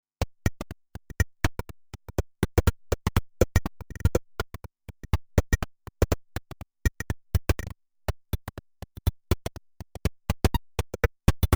Jomox ModBase 09 MK2 Metal Noise 1 und 2

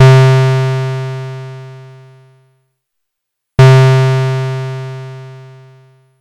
Erica Synths DB-01 Rechteck

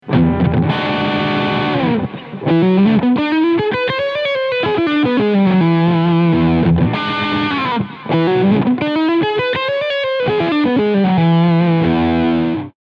arpeggios spielen fuer gitarristen E dom7 Arpeggio

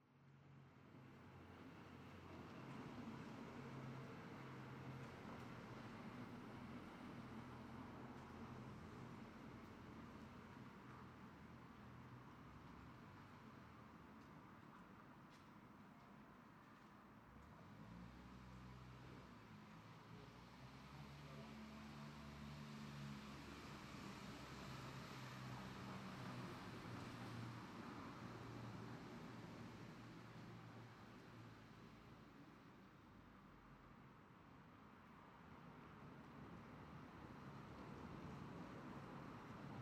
Mittag in der Stadt; voyage audio; spatial mic virtualisiert

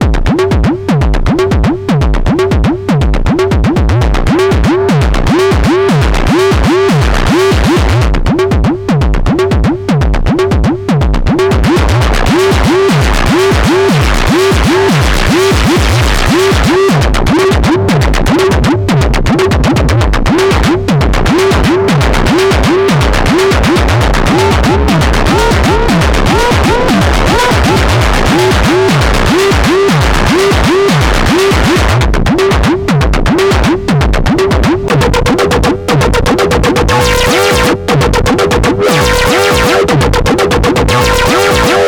pittsburgh taiga 4 drum sequenz

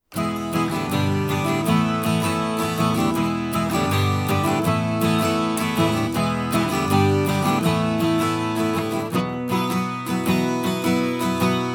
12string EQ nach Wunsch

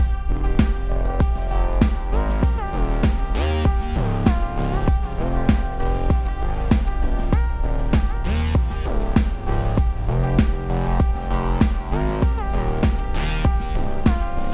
mit Snare mit Harmonics

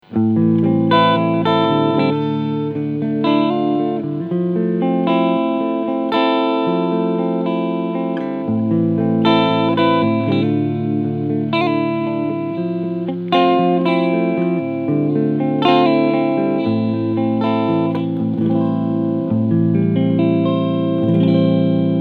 Cleansound beide PUs

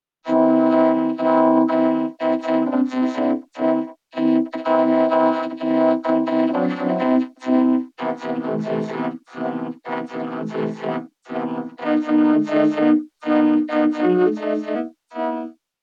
Vocoder 1 Okt Up V PLus

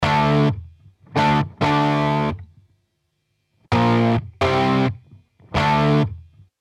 E-Gitarre Tape; MELLO FI

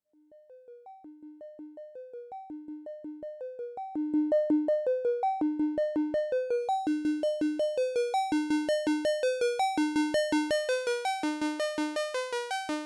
quad operator shape und detune

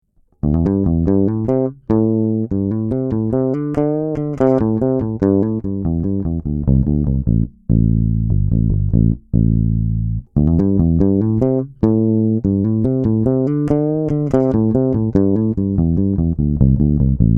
Neve 88RS Bass erst DI dann mit RS88

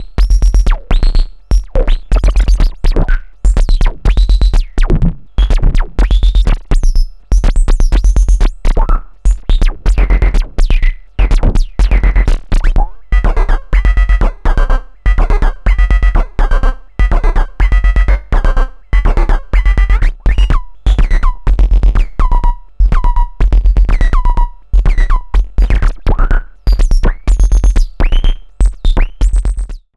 Error Instruments Drums Liquid Glitcher COMP Steuerung

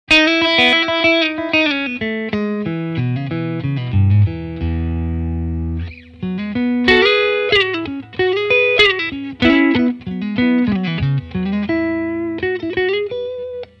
Hals clean; Larry Carlton T7 AWH

